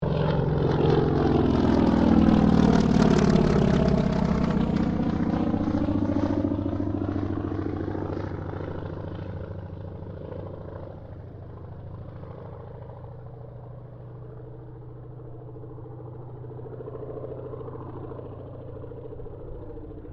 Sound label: AIRCRAFT CAC WIRRAWAY PASS